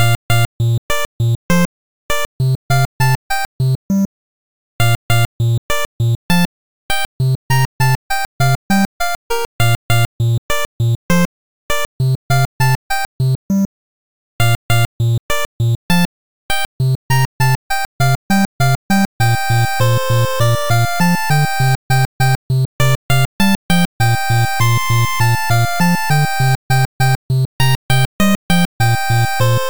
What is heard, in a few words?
select plains bpm100; 8bit action stage select